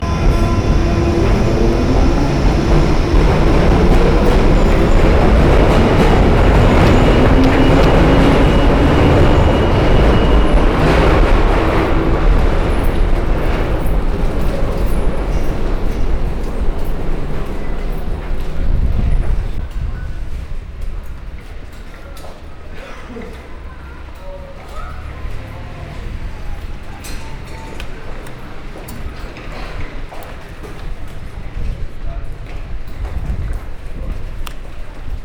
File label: London Out Of Tube; Walkman Performance